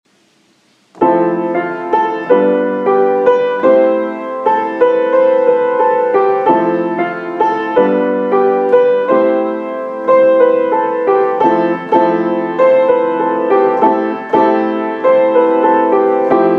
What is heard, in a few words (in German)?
Klavier